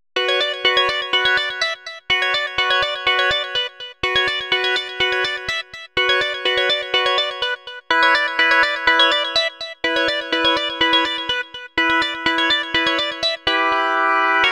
Hard Organ; Sonic Foundry (Sony Creative Software); Club Joints and Street Anthems; Game Time

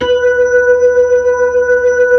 B-3, Blues

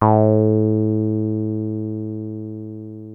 303 A2, TB 303, Synthesizer; Hollywood Edge; Giorgio Moroder Rare Synthesizer Collection

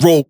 vocal shot; HIDEO

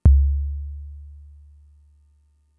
Kick, Drum Machines Fricke MFB-522

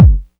Boomy Kick; Drums; Kicks

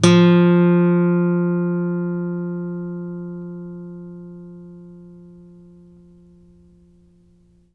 ACUSTC F 2, ACOUSTIC guitar; East West; Steve Stevens Guitar Samples Collection; ACOUSTIC PCK

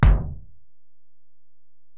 Ac BD, Alesis DM-PRO; 300 Drum Machines

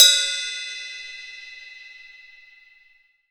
K-ZIL HHC, 14INCH K-ZIL HI HAT

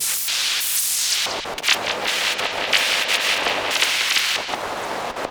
Filtered Noise, radio signals

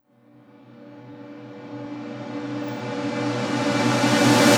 Reverse Synths Bmin; Effects; Vengeance; Essential Clubsounds Vol.4

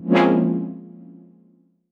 Fm Dub Chord

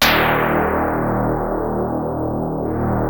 poly deep D#, Polymoog; Greytsounds VOL-1

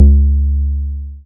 Bass SH-101

